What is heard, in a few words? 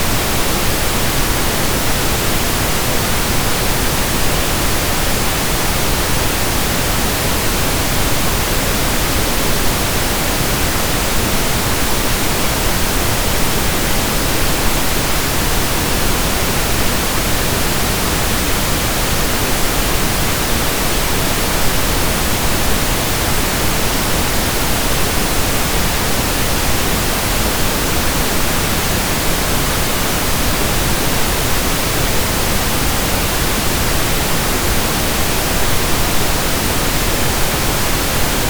Pink Noise